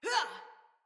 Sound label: Attack Female; Battle; Envoy